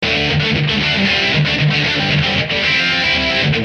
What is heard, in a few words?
D 113 rhythm